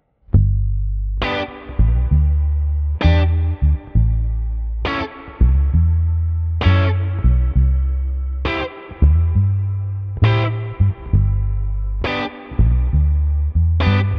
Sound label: Blues